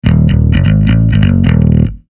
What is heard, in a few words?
instruments bass; maoudamashii